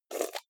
jar plastic midsize open fast

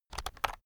keyboard external shortcut medium

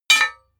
hammer hit metal clank

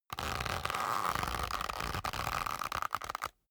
leather creak long soft

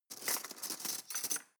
cutlery teaspoon drawer handle

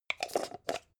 jar plastic midsize close

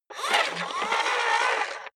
robot movement slow

